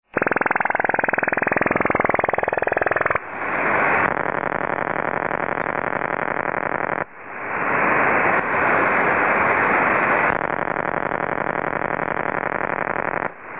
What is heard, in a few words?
Ionospheric Sounder or OTHR Radar